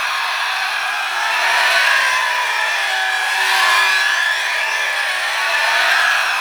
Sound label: Boss Spirit One Loop; Warframe; Cephalon Weave